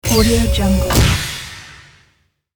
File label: heavy ship door; sound-ideas